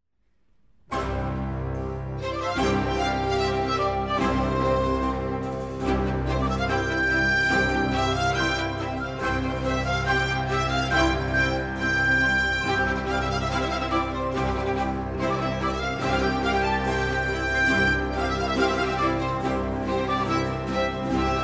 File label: toccata; monteverdi